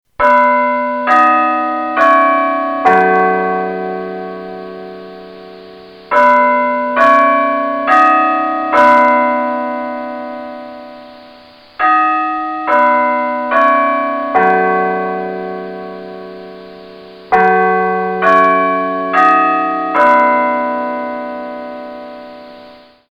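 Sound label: Westminster, Church Bells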